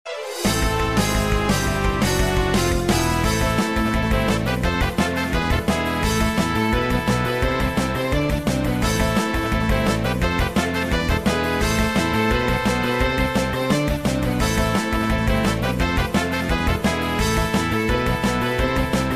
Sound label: mining battle; Adventure Dig Dig 2; Android